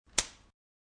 Leath Skin, Quick strike of a leather whip on skin.; Whipping; Combat